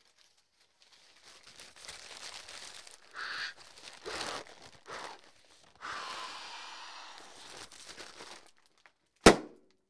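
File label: bag burst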